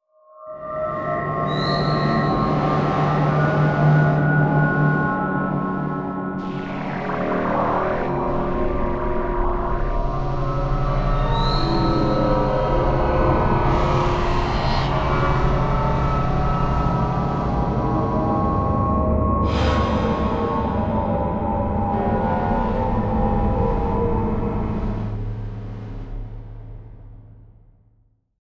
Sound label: Imaging Alien Suspense; AdobeCC; Imaging Elements; Module Production Element Title